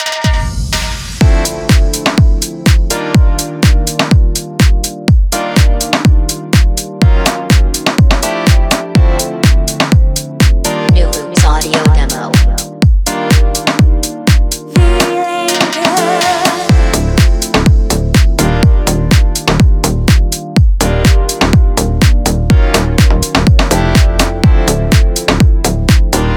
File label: Feeling Good Deep House Kit; New Loops